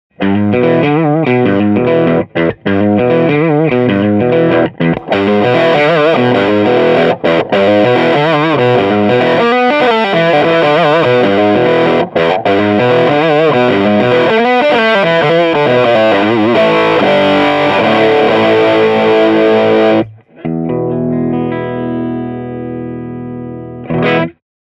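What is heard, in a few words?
position, pedals; gboy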